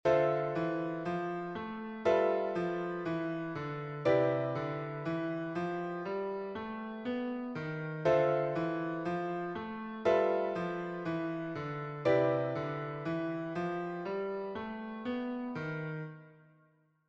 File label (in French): walking ton demi ton, improvisation jazz; walking bass; Progression par tons / demi ton; Enchainements ii V I